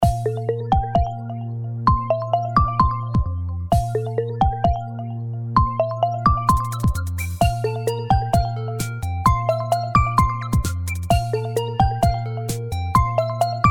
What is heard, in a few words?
xperia alarm